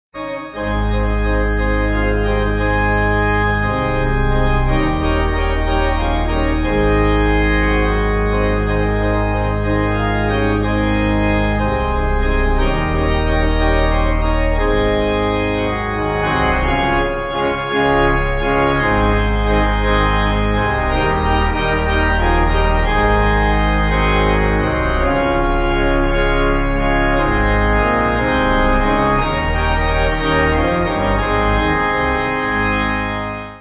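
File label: Pipe organ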